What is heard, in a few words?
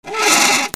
FRICTION